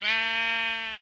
say, sheep; minecraft

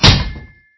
metal, mob zombie; ayuncraft-1.5

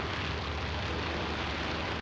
attack loop, guardian; minecraft; mob

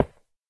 stone, blocks

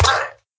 death, villager; minecraft; mob

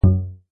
bassattack, note; Eaglercraft 1.5

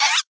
land idle, guardian; minecraft; mob

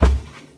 step, cow; ayuncraft-1.5

